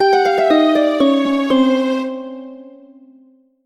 horror lose; mixkit

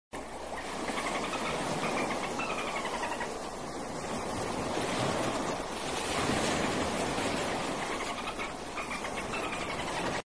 Amazon river dolphin; دلفین صورتی

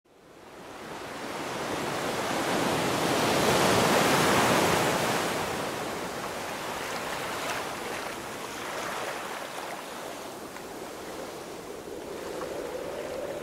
Sounds of the Cliffs Waves

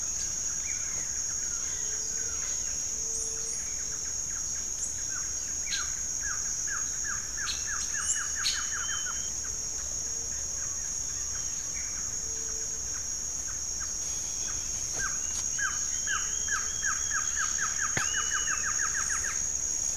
Glossy Antshrike

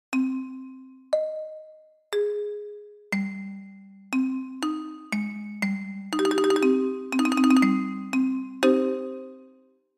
Marimba

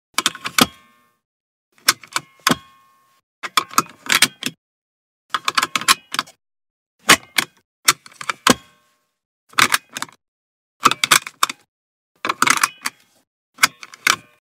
car belt; کمربند ماشین

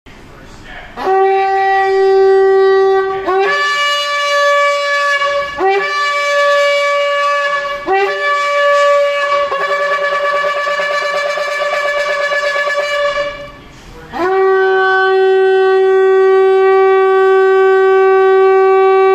shofar

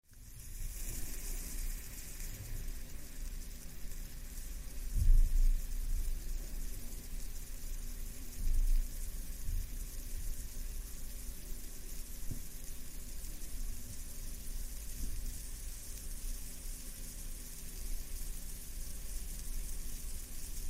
Hourglass

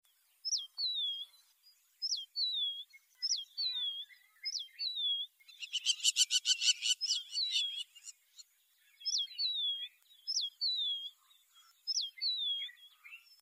Larks Sound

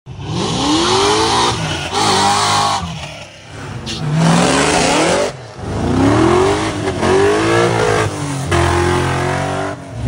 Muscle Cars Take Off

